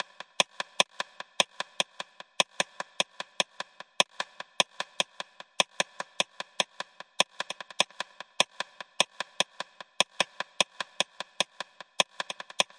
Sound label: DRUM LOOPS and BREAKS, 150 bpm; rimmed round and back; 141 to 160 bpm